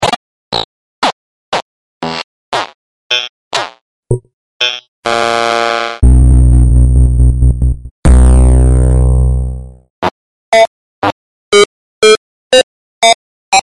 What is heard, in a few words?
8bit, click sound